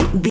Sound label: footstep metal low run